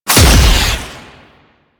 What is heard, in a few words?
Slinky Dead Zone Cast; Warframe; Man In The Wall